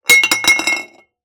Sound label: Wine Bottle Empty Knock Over Concrete